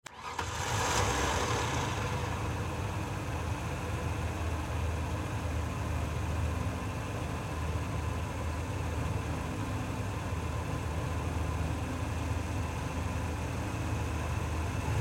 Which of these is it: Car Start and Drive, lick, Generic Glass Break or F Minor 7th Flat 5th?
Car Start and Drive